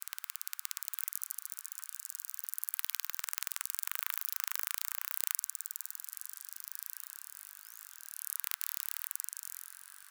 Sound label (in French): clics; globi